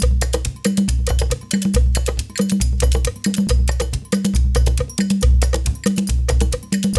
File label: Gyana HiLife, African Contemporary; Spectrasonics; Supreme Beats